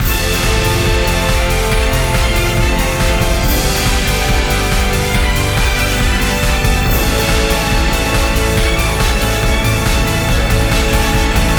Final Victory; Miraculous Rise of the Sphinx

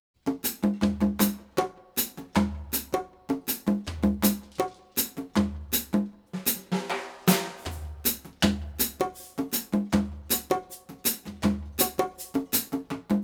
Slow Percussion

